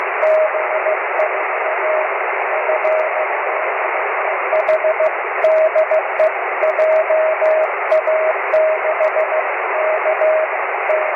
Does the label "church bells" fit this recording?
No